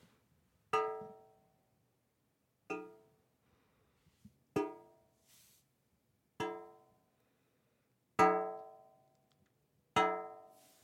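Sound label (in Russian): постучали и ударили по металлической перекладине вешалки из ящика для перевозки одежды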